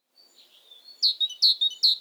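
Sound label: short tit sound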